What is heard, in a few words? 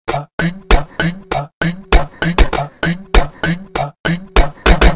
panic; Garry's Mod; sprunki secret